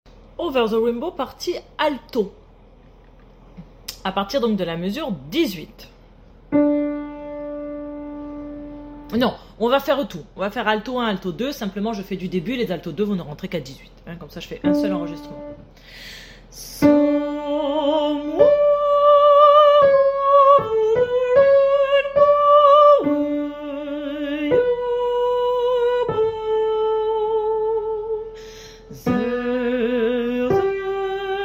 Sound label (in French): Alto